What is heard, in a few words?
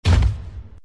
fall; openspace3d; kidkitchen